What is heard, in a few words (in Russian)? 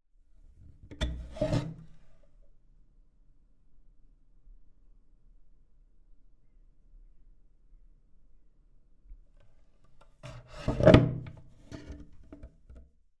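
открытие и закрытие старого окна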